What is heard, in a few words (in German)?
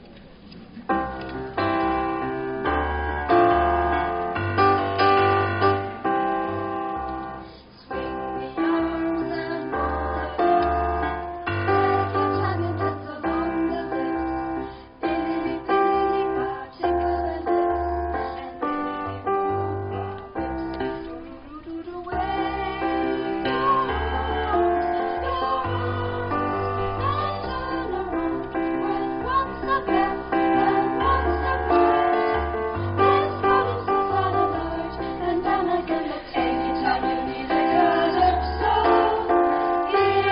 Unterstufenchor Calypso; Jan Holdstock